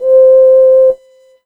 Korg DW 8000 Whistle C5

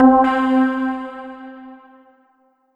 Roland JV-2080 Echo Drops C4

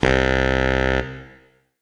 Alesis S4 Plus Bari Sax C2

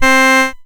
1980s Casio Organ C5

